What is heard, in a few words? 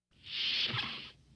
Enterprise Doors